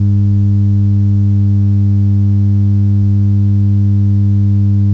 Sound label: buzz, ambience; svencoop